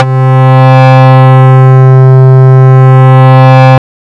SY BUZZ; ModelCycles

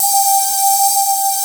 VOX G5; Big Fish Audio; Synth City

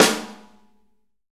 Room Snare, Room Drums; Akai MPC500

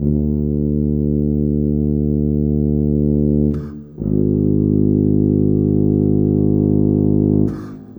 Brass, Orchestral, Rock Pop

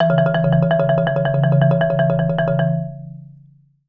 E2, Marimba roll; Sampleheads - Dave Samuels Marimba & Vibes

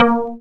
A#, Synclav FM PERCUSSION; Big Fish Audio

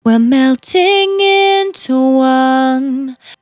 Finally 70bpm C, Velvet Vocal; Zero-G

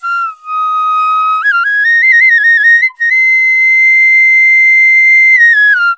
INDIA PICC; Spectrasonics; Heart of Asia; IND PICC PH

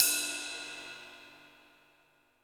Pop Ride, cymbals; Roland BK-5

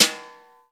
BRUSHES, 12-Bit Drums

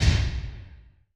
Thunder, Alesis D4; Efx

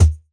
Kick, jungle breaks drum sounds

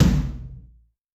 Night F, Tom, Yamaha DTXpress-II

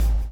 BLOW; Spectrasonics - Backbeat

